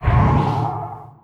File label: Hit Summon monster Cast